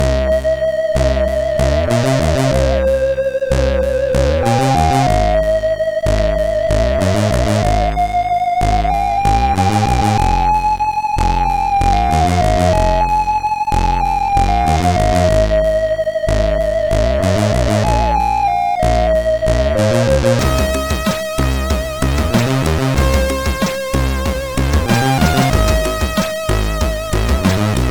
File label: Pipe Solo sid CSG8580R5